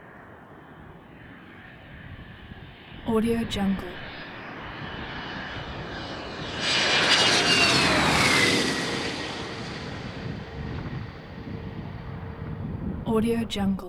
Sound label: ltv a7 corsair ii corsair military jet approach